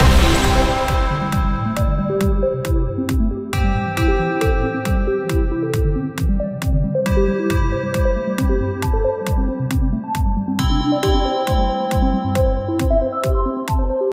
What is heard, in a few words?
Win Music Loop; Action Ops Snow And Sable